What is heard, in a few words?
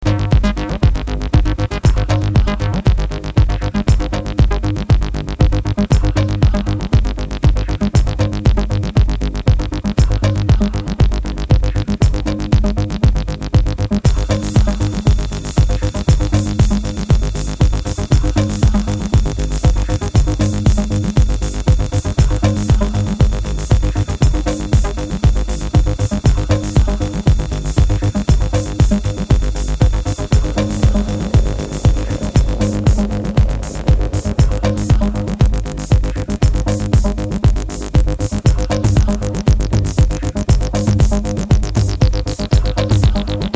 Wardrum Acid